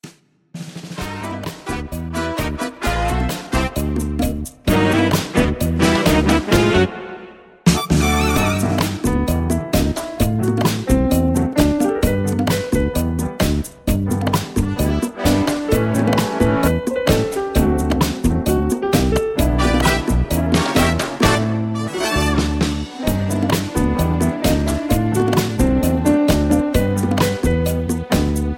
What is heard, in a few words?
Instrumental Key of C